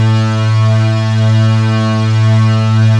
OMNI REEDS, ARP Synths; E-MU Formula 4000 Series Vol. 3; Analog Odyssey; ARP Synths II

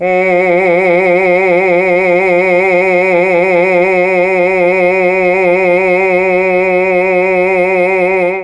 F#2 CHAMB MA, Mellotron, MALE VC; Mike Pinder - Mellotron Archive CD; CHMB MALE VC

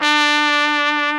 SOLI TRP, Alpine Volksmusik, TROMPETEN; Best Service

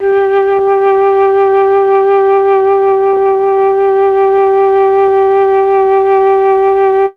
flute; ILIO; Propeller Island Legendary M400

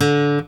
pop, Guitar

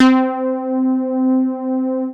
MOOG BASS C4; Best Service Dream Experience

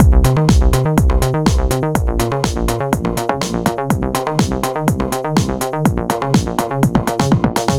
123 bpm full, combi loops, nu dance electro; Zero-G; Soundclash; Acidized Wavs; The Arches

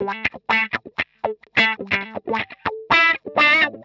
125 wah A, wahwah; PowerFX - Nu Jazz House

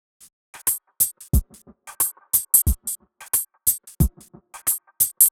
Gated Beat (90BPM); musicradar

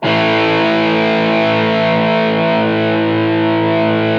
GTR A MAJ, ROCK GUITAR; Greytsounds VOL-1; ROCK GUITAR1

